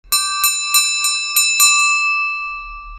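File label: school bell ringing realistic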